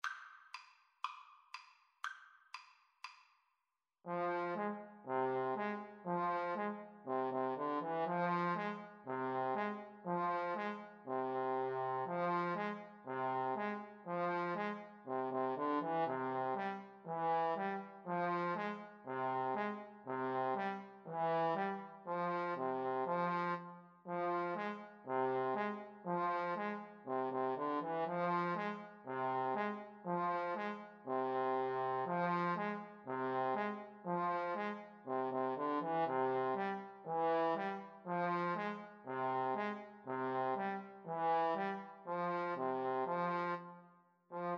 Odessa Bulgarish trumpet trombone

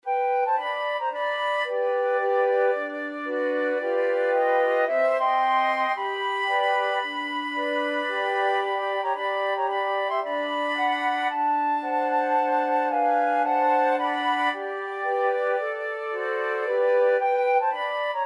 flute quartet

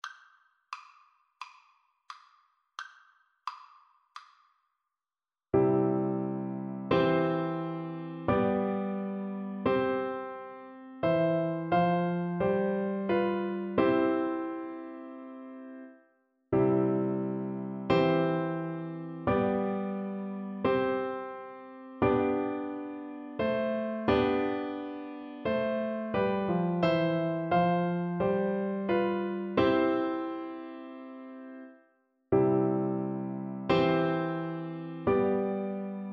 Oboe

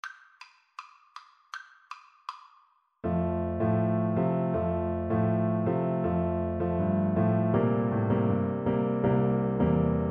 la cucaracha, Trombone

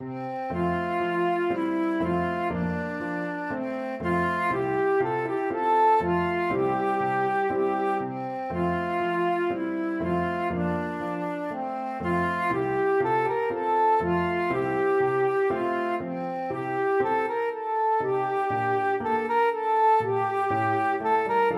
brahms sym 1, flute

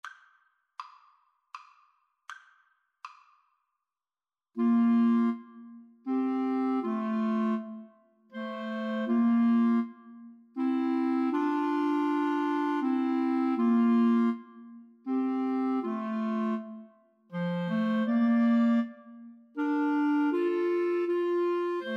Clarinet Trio

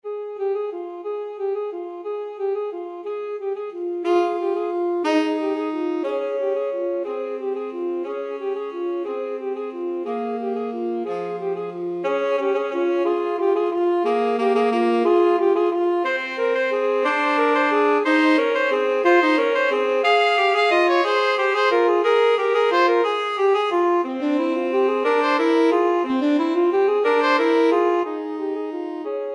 alto sax tenor sax; carol of the bells

